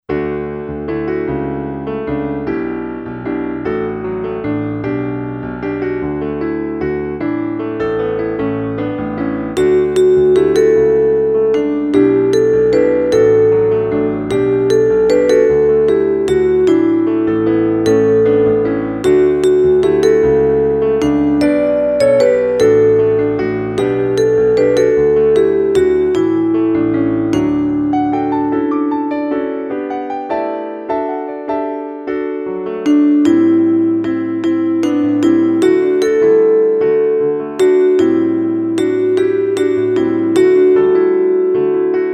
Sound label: Entrance melody; Holy Thursday